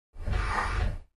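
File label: Space Door Open Slide, doors high tech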